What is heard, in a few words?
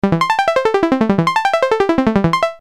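arp down; nord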